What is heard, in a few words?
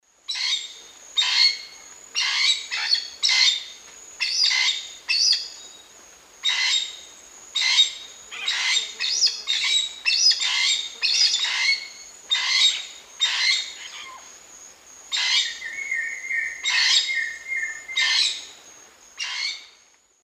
Pteroglossus castanotis, calls; S. America; Eastern Lowlands